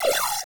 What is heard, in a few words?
ZZWOSH, STRANGEFX; Total Control - Action Cutz